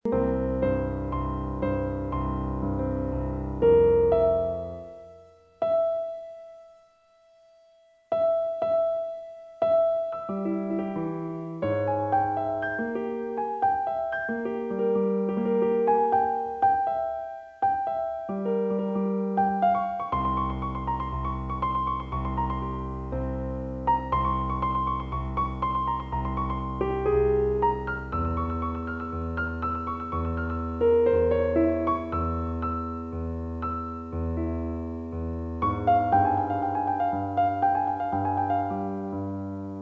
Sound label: Piano